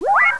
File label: piko